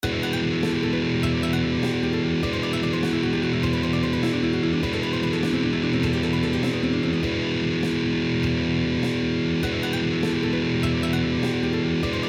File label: 2 String Pattern Descending Skipping Exercise